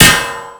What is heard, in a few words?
hit; Rust